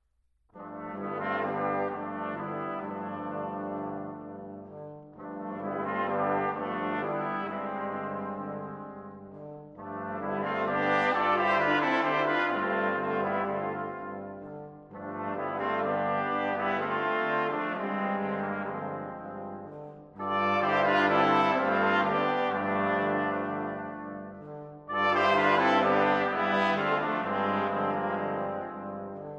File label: Quintet, Brass; Mvt. 1. Prelude Fantasy